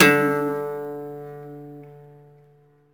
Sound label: Cello Hit String Snap; SFX Kit; Foley - Misc